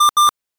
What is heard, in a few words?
boost finish; super space game